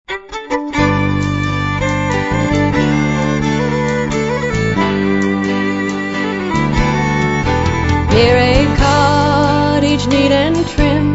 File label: Violin Waltze